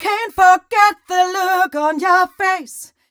f mel e flat, fem Vocals

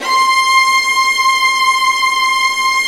String Sections Violas, Bright; Roland LCDP13

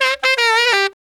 SAX LICK, BRASS LICKS; Ueberschall; Jam Box

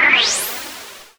Noise Hit

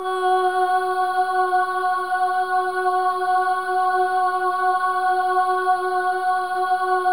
SOLO BOY, AH; Spectrasonics; Symphony of Voices

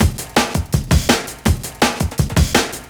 Call And Response, Drum Loops, 165; M8; Electronisounds

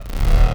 Basz, One Shots; Sonic Foundry (Sony Creative Software) - Future Retro Dance Excursions

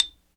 XYLO, Afro Xylophn

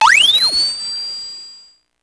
Drum, Electro-Harmonix Spacedrum

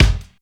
SPEED KICK, drums; Basement drums vol 2

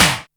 Boss DR-660 Snare, Drum Machines

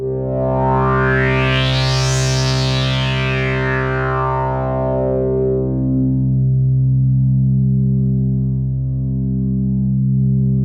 H.SWEEP, BIG SWEEP; Masterbits; Vintage Voltage

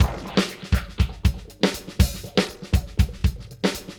Beat Loops; Zero-G; Monster Beats

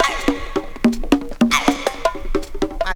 162, breaks

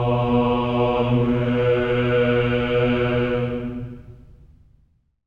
amen; Spectrasonics; Symphony of Voices; GR AMENS

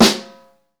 snare, Roland

